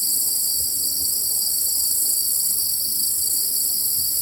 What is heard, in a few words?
Amb Animals; Red Orchestra Ostfront 41-45